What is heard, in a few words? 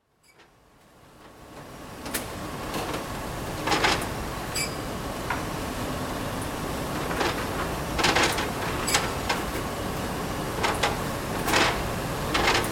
China Factory, plate making; Factory Sound Effects; Factories; BBC